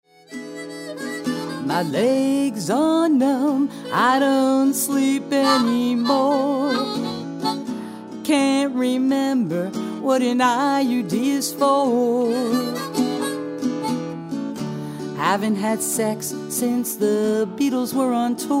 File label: old fart blues